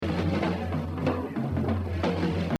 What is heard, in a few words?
Exercise, jazz drumming; Art Blakey; The Evolution of Jazz Drumming (Gottlieb)